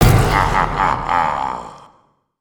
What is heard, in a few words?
smashable; Blue Demon; destructibles